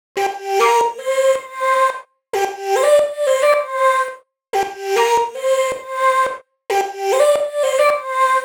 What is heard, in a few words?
Bpm110 G Vox Flute; FireLight